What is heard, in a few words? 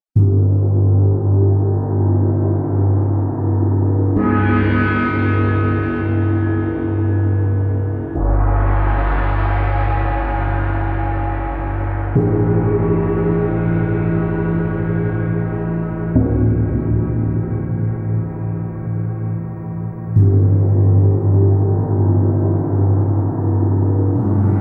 Metal Mallet Meditation